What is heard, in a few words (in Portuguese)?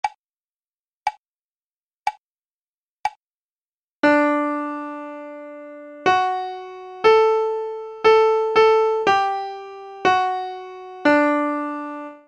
imitacao melodica; Processo Seletivo UFAL 2018 - Curso de Licenciatura em Musica